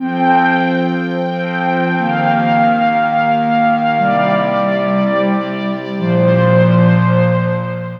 Airy String; Strings & Pads; Sonic Foundry (Sony Creative Software); The Electronic Music Manuscript Collection

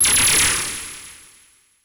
CABL TEN, CABL TENS; Total Control - Action Cutz

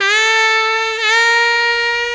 Sax Lick; Drum & Bass

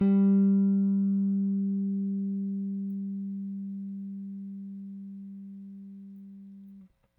Rick Thumb A, Rickenbacker Bass; Airwindows

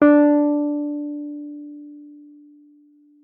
WUF D4, WURLITZER; Xsample VOL-1

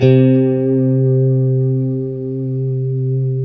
C JC120; Guitars; Spectrasonics; Hans Zimmer Guitars VOL-1; JC-STRAT MF

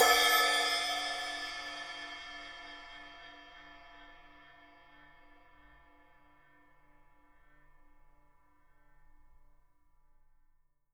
12 EFX CYM, FX CYMBALS, Living Drums; Sampleheads; Peter Erskine Living Drums

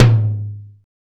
TITETOM LO; Drums In Your FACE!

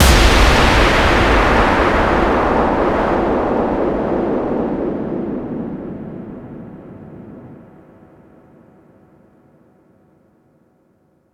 FX Kicks

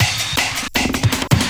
Drum & Bass, 160 BPM

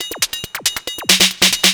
drum 138, drum-loops, 2bass, Techno

